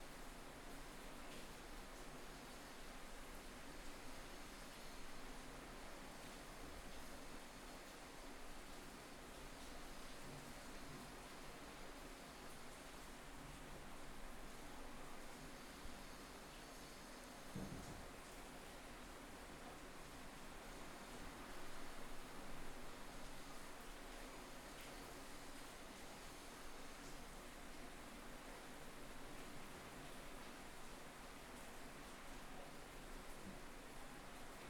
Ground Floor Kitchen Open Window Rain, Ambisonics, Roomtones; Sonic Bat